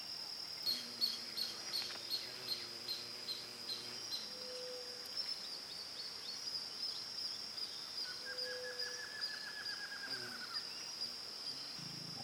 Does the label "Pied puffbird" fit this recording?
Yes